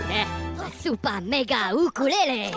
ukulele; super mega

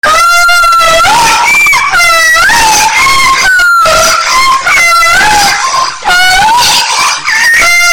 high pitched slowed sound; alexis texas

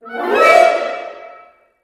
orchestral suspense

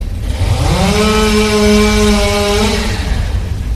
chainsaw; soundbible

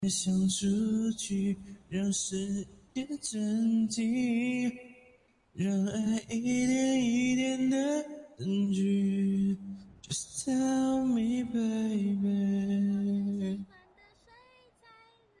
singing

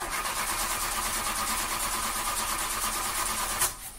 car engine failing

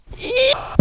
yeow

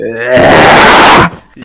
deaaaaaahhhh

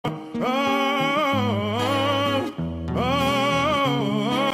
aaaaahaaaahaa

